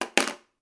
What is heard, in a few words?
impact plastic remote control hit table; sound effects one